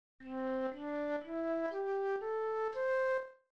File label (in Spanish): pentatonica